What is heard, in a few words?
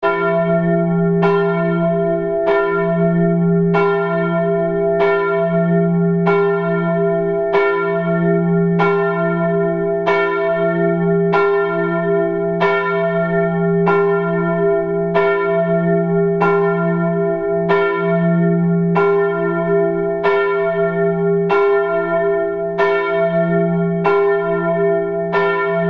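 zvuki kolokola, bell sounds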